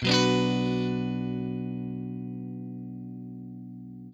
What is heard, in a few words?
CHORD LN M, guitar; Quantum Leap - Guitar & Bass